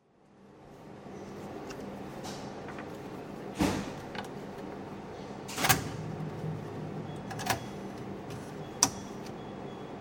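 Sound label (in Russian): звук снятия денег с шелестом купюр в руках получателя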